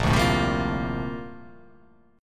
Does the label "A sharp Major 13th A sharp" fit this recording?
Yes